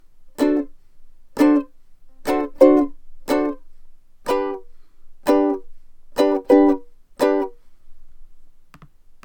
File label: single double, ukulele